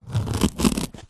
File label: foley foam block dense slice score sharp knife tip